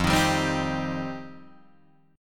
F 7th Suspended 2nd F Guitar DADGAD; F7sus2; strummed

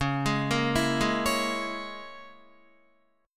C sharp Minor 6th C sharp, Guitar; C#m6 arpeggiated

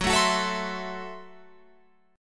Gb Minor 7th Flat 5th, Guitar Open F; strummed

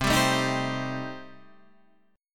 C Minor 6th, chord

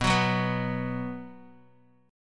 B 5th B down Bass Standard; strummed; JGuitar

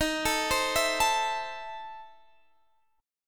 E Major 7th Suspended 4th Sharp 5th, D sharp

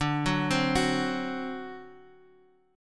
D 7th, down, Guitar, Half Step Down

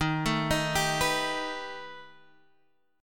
B Augmented 7th D sharp

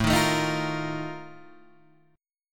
F 11th A, chord

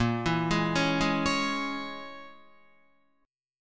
Bb Minor 7th Flat 5th, Guitar; Bbm7b5 arpeggiated

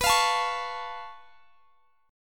C sharp Augmented 7th B, chord

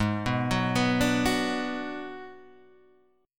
Ab Minor 7th Flat 5th Ab, down, Guitar, Standard; arpeggiated; JGuitar

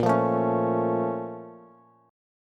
Bb Minor Major 7th, Bass; BbmM7; strummed; 18,20,19,18; JGuitar

